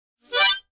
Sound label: Mouth-organ, Short And Very Fast Glissade, Type 2; woodwinds harmonica